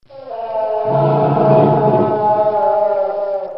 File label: moan, ambient; STALZONE